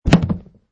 Box Drop, Plastic container box drop - Variation 6; Drops; Objects